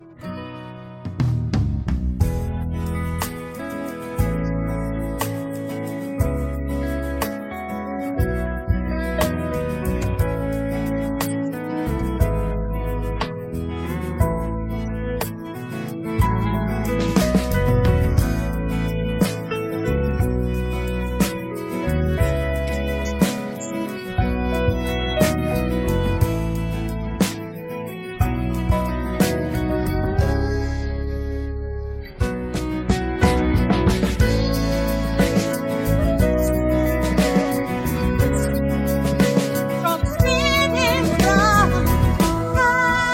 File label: instrumental